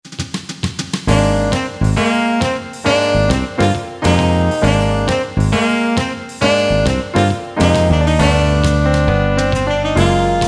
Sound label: jazz, jazz fusion